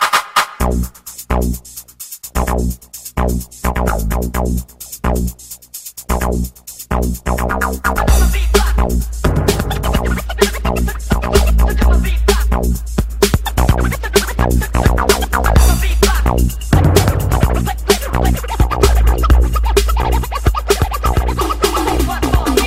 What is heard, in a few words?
Scratch